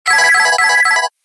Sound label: Serene tone; Samsung GT-M3510 Beatb